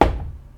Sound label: Dull thud